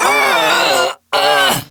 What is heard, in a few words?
Death, Barks; Kuva Lich; Persona C